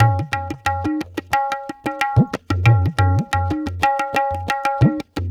Tabla; Sonic Foundry (Sony Creative Software); Ambient Grooves

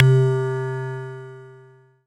C Synth, One Shots

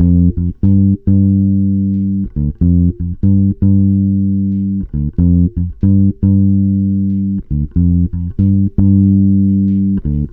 COWBOY 93, Bass Grooves; USB Soundscan vol.30; AKAI

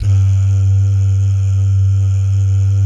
DAH, Vocal Planet, Jazz & FX, DAHS GUYS; ILIO

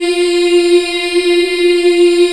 F3 CHI IH-L, Choir; CHILD IHF

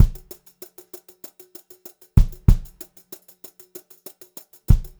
brushed drum loops; USB Soundscan vol.39; AKAI; 90 s Sample CDs; 96 HOTROD

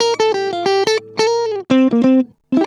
ACID JAZZ; Best Service Advanced Media Trax Modern Composer

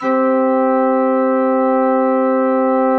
SUPER CHIFF C3; Greytsounds - Scott Peers Sound Engineering

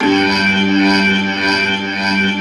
G2, Keyboards Old School; USB Soundscan vol.09; AKAI; BIG HAMM

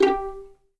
G4 PIZ MF, string; East West; The Ultimate String Collection

